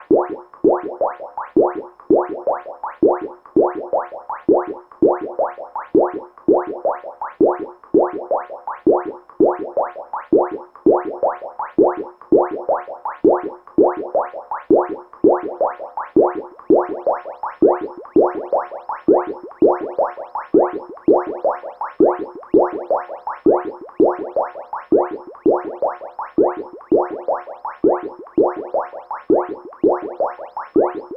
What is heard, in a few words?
water drop groove delay